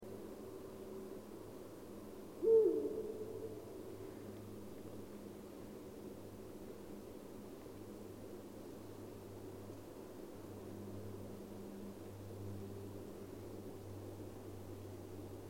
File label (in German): uhu